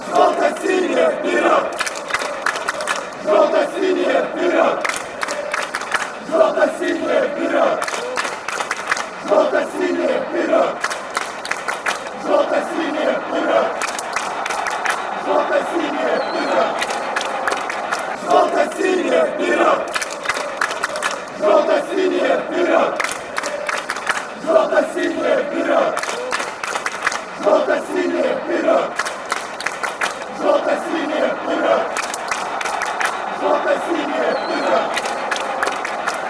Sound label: metalist chant, fanchants